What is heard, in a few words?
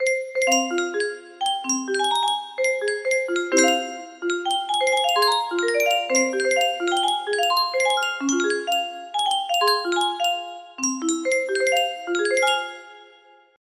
music box; star wars